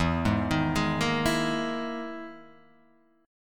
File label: E-Major Sharp 11th E, Guitar Half Step Down